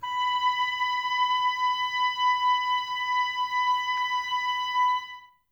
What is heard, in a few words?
OBOES B; Miroslav Vitous